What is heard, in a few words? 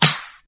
steel snare drum d sharp key; steel snare drum sample; snare drum samples